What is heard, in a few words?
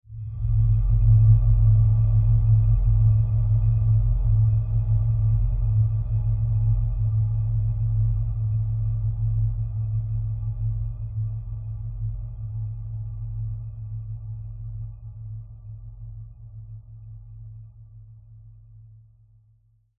science fiction dark drone empty desolate fade